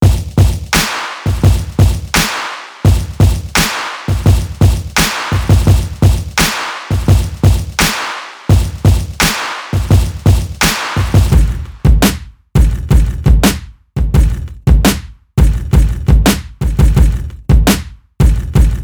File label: Kicks Loops